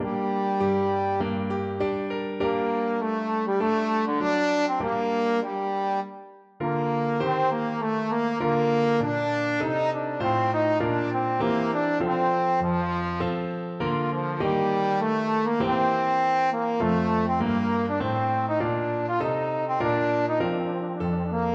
sonata, trombone

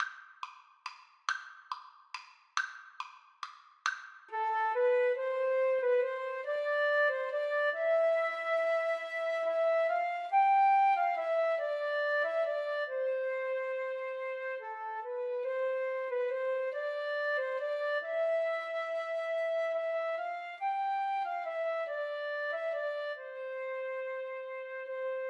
siciliana, Flute duet

